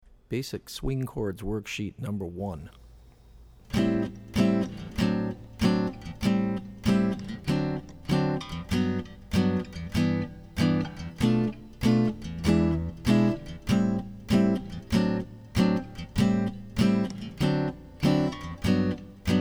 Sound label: Basic Swing Chords; jazz chording